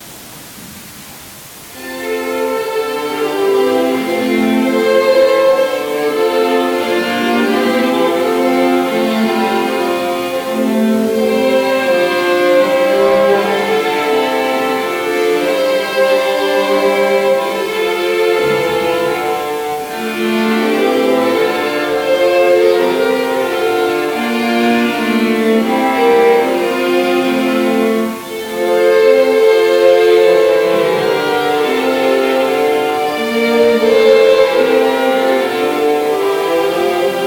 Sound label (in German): Instrumental Quartett; 2014 adventskonzert